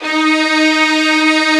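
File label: Silk Str d sharp 4; Nintendo; July 2020 Gigaleak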